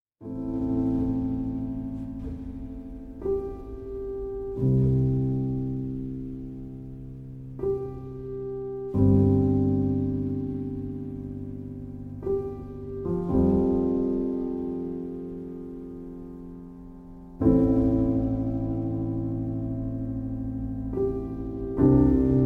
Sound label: Piano Relaxation, Spa